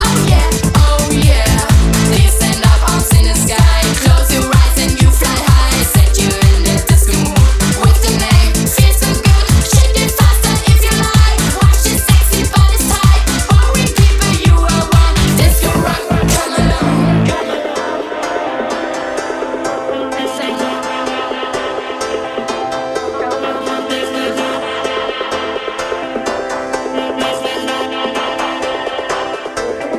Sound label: Monofunk Discorock